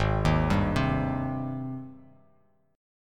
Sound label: G sharp Major 7th G sharp; 4,6,5,5; JGuitar